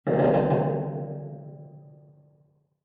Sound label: metal creak; Dystopia; injection